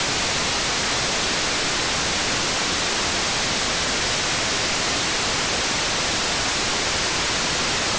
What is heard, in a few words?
whitenoise